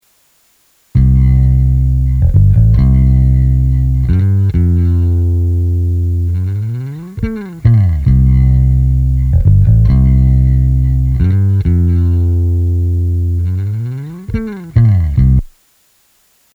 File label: Bass; WES Audio Mimas